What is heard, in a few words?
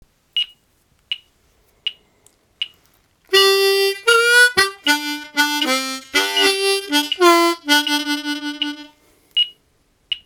Bend turnaround